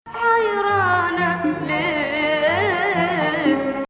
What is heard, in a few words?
Rast Nahawand